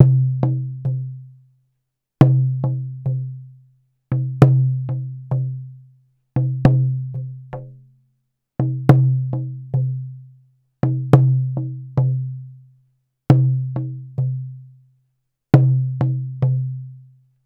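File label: Big Drum felt mallet; War Cry (Cayovinto)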